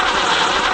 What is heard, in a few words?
rocket fly